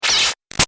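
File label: Shot Laser Medium; Sound Design; Lasers; Big Room Sound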